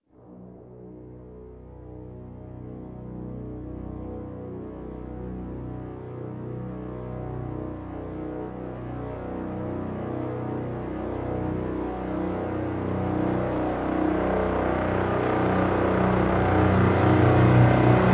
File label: CRES Brass Swell Lo (B)